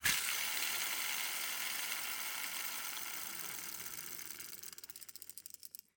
Rollerskates, old fashioned, all metal, wooden wheels, swipe, spinning, slow to stop, friction; Eiravaein Works; Vaeyan IV